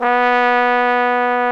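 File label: brass ff trombone B3; Roland L-CDX-03 Disk 2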